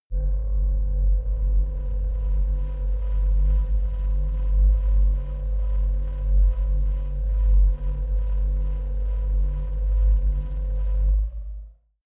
sci fi

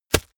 plastic tense bag quick

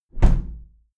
window plastic squeak close heavy

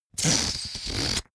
balloon air release fart airy

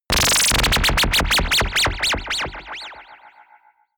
general ufo twistings

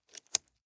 nylon leather click

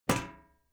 club hit sheet ring soft

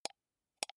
computer mouse wheel click slow soft

